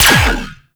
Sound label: Laser Fire; Warframe; Corpus; Ambulas